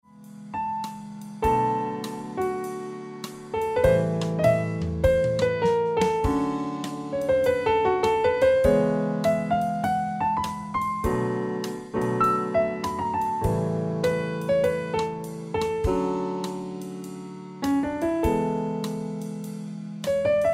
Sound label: Ringtone